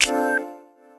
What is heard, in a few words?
strike; patatap